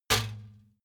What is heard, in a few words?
trash basket metal hit